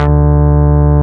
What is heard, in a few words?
Bass D, Root D